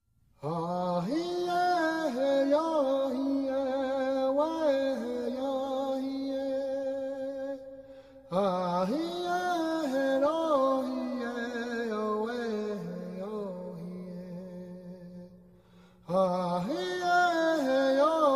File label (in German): Lakota Wiegenlied